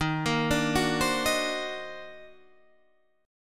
D sharp Minor Major 13th, Guitar, Half Step Down

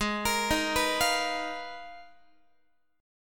Ab Minor 6th Add 9th Ab, Guitar; arpeggiated; x,16,13,13,15,16; down; 4A,4E,5A,5D,5Gsharp,6Csharp

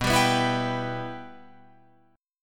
B Suspended 4th Sharp 5th B Guitar Open F; Bsus4#5; strummed; 11,14,11,11,14,14

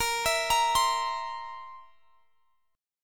A sharp Suspended 2nd Flat 5th A sharp; A#sus2b5 arpeggiated; x,x,20,21,23,20

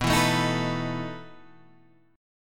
E Major 7th Suspended 4th B

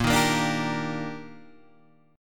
Eb Major 7th Bb

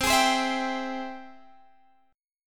G sharp Augmented Major 7th C

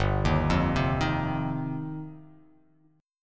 G 7th Suspended 4th Sharp 5th

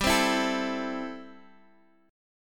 A Minor 7th G

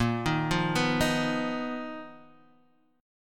B Major 7th Flat 5th Bb, chord shape

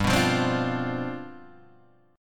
G 11th, Guitar, Open G

